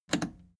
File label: plastic button scratch smooth click enable fast